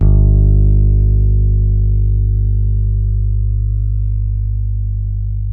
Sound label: H. FLS A 2, Bass; Spectrasonics; Bass Legends